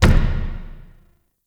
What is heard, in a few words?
Knock; Shadowgrapher; Warframe